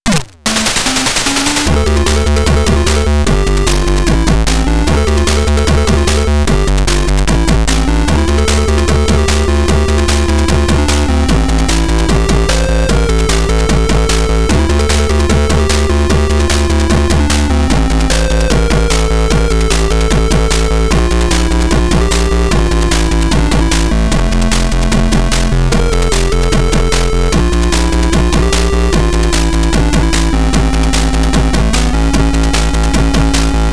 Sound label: Stage BGM Atari ST; Street Fighter II; U.S. Gold collection